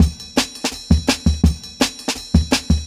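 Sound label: Jungle Breaks, 167 bpm; 90s Sample CDs; Original Jungle Breaks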